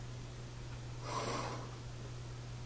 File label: Breath